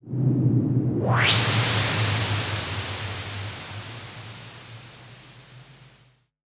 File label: Virus Noise